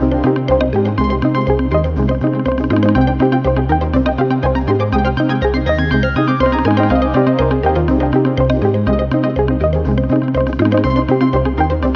ELEKTRO LOOP, ELECTRONIC; Rubber Chicken Software Sample Bank

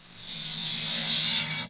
METAL BRUSH, Disjointed Textures; USB Soundscan vol.33; AKAI; BLASTER

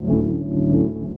Chords Tones; Equipped Music; Loungin House

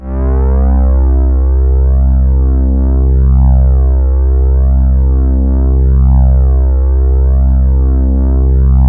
C1, ANALO STRING; Sound Gallery - Charged

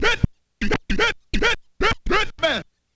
Scratch

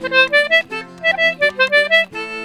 C6 L, Celtic Flavours; USB Soundscan vol.42; AKAI

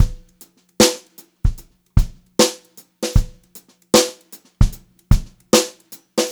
Brushed Drum Loops

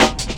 helicopta cuts, drum loops; Zero-G Jungle Warfare 1, 2 & 3

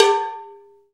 PER COW, Cowbells

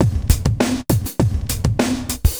Street Tip, R&B Drums

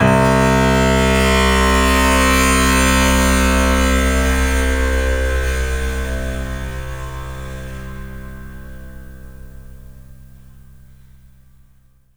RATTL A#2; 3D Multis; 3D Pads

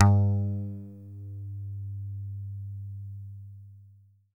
E-GITARRE, Alpine Volksmusik; Best Service

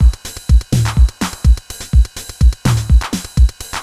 drum loop scatter full 125, Classic Chicago House; Sample Magic

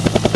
HELICOPTER; AKAI S-Series CD-ROM Sound Library VOL-8; SFX

